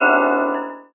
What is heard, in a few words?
mascot pain; Fight Knight